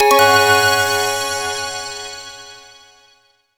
Level Up; Earth and Beyond; EA Games

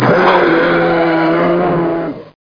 GARG DIE

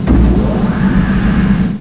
pump on

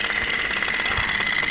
Camera Sound; Centri

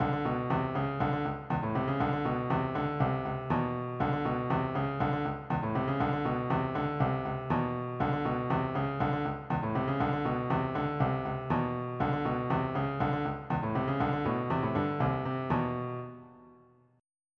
Funk

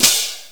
Hand Cym; XG; Drums; Closed L

